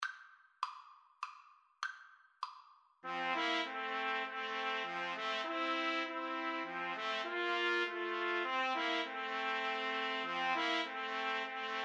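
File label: trumpet, karaoke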